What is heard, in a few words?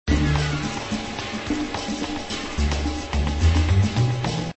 Loop; Stackopolis; Windows gamerip 2006